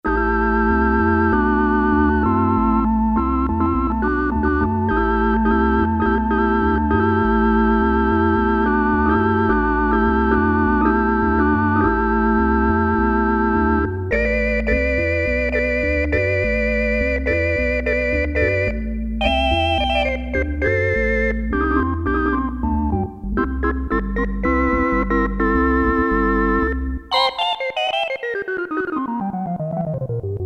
COMBO ORGAN, Roland Jupiter-8; Factory patches

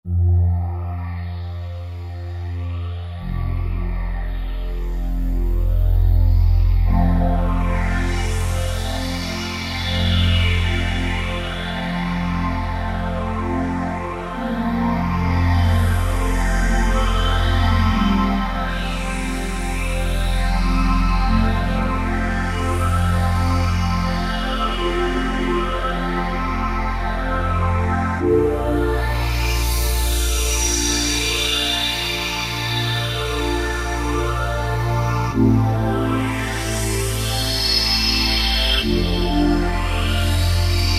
Golden Sands, Roland JD-990; Factory Patches

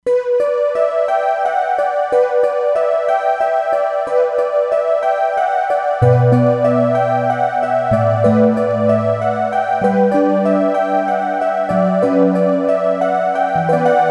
Air Guitar, E-mu Proteus-3; Factory patches

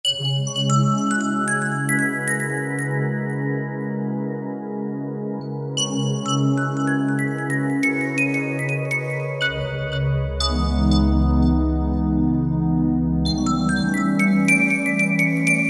Toy Land, Generalmusic S2R Performance

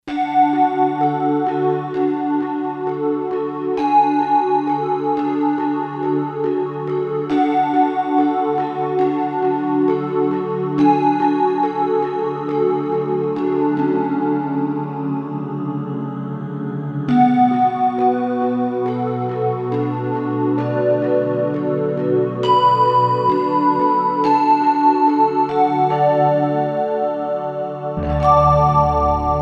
Air Bell Pad; Roland SC-880; Factory Patches

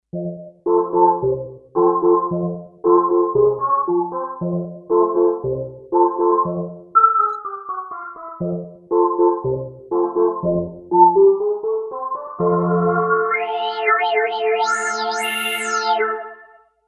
Heater, Kawai K5000S factory presets

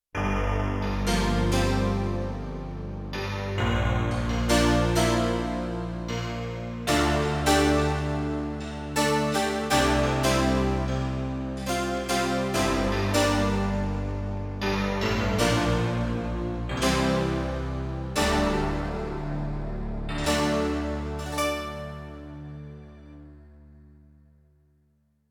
Metal Clav octave; Yamaha TX16W; Spacey Keys 2

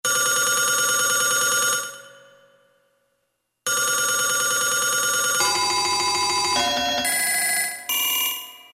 Telephone

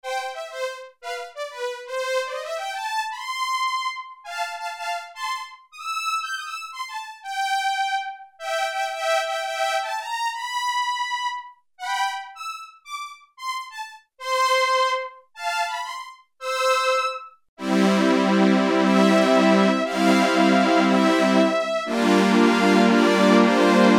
FIDDLE, Oberheim OB-8; Factory Patches

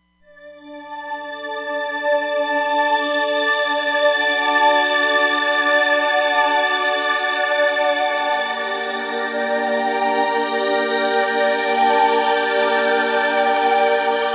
Holy Breath

